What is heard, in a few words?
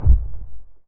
Step